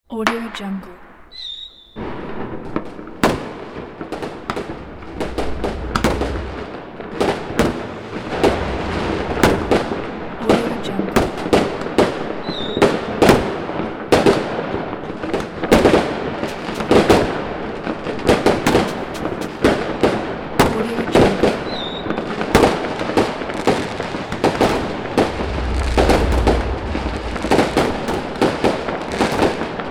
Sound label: fireworks and salute